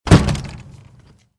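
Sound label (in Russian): звуки дверей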